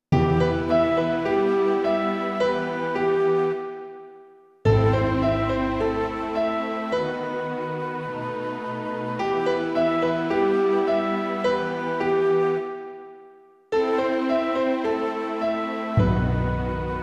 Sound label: Classic Theme Small Orchestra